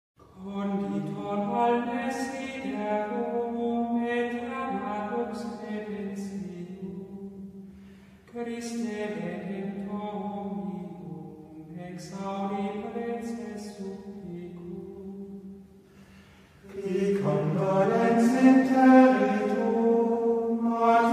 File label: choir